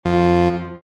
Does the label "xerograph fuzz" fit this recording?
No